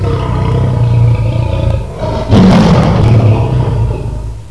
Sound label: TIGER; BOXEM